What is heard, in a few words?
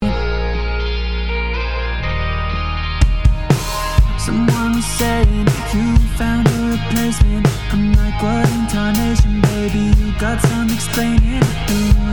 Pop